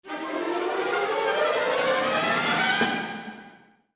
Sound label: sparking glissando